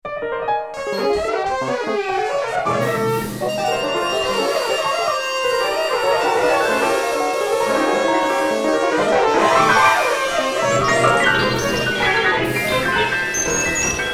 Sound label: PEZ MUERTO, pocket analog; .1kHz 16 bit 192k